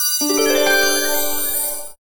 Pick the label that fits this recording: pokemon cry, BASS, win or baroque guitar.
win